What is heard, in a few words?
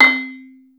stone hard, Yamaha Motif ES drum machine; 300 Drum Machines; Yamaha Motif ES-Series